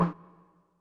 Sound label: scrub, Yamaha Tenori-On; Scrubkit